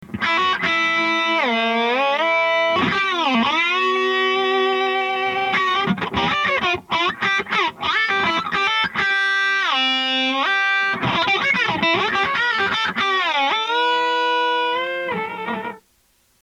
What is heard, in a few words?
digitech ex7, guitar effector; guitar effector variation